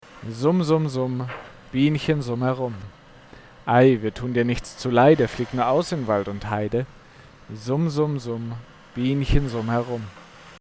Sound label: DPA 4488 Cardioid Vocals Background; Fischkutter